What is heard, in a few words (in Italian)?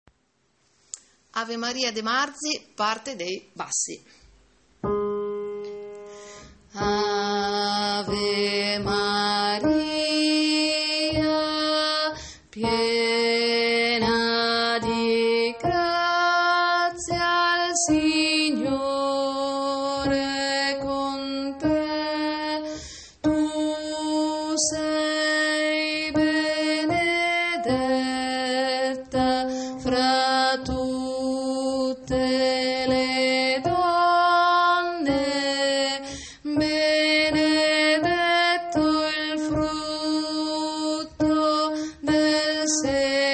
bassi